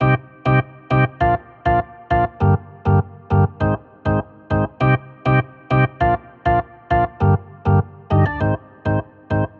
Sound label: Organ Pop bouncy chords Cm 100; Sample Banks; Melody; Keyboards